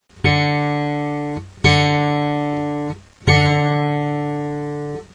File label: Bass Guitar D String